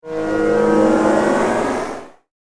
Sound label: Alien Transporter Beam; Conflict Earth - Alien Transporter Beam; Alien Sound Effects